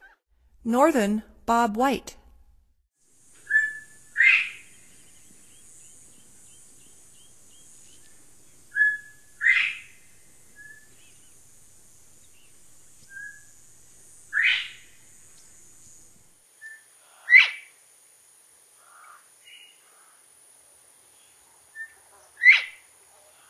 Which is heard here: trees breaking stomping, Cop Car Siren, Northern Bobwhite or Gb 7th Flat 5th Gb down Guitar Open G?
Northern Bobwhite